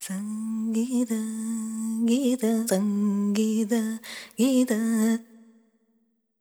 ETHNO FEMALE, Vocals; ethno2

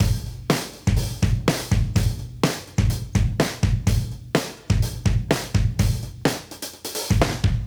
Modern Breaks; Touch Loops; ClassicBreakSessions

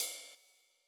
Ride

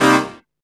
dance chord

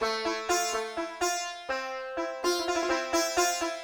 ethno, 125, A

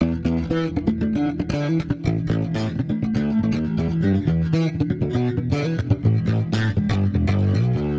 120 F Paper Funk, Warped Bass; Loops; Touch Loops; CosmicFunk

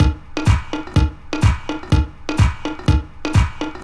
DEEPHOUSE BEATS 125

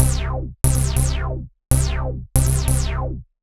ELECTRO BASS 140 A

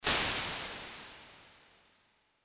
Large Plate

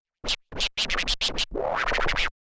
OLDSCHOOL SCRATCH 96

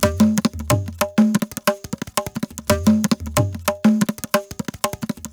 ETHNO PERCUSSION 90; Beats; ethno2

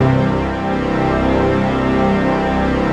PAD

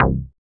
BASS MONO

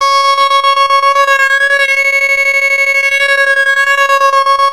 WABBA C5, SYNTHS; OBMX-SYNTHS

